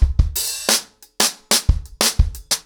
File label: 90 BPM, acoustic drums, drumloops; The Roots Drumloops